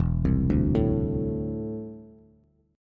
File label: E 7th Suspended 4th Sharp 5th E down Bass; arpeggiated; chord; JGuitar